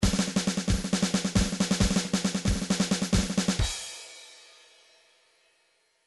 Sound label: trance midi loops fills military; Newtronic Trance Constuction Kit